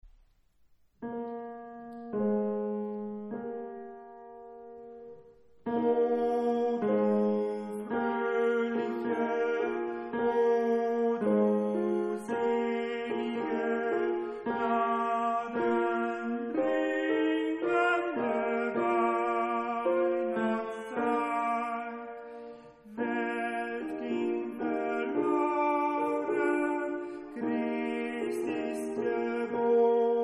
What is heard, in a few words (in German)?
Oh du fröhliche tenor; Weihnachtsprojekt 2024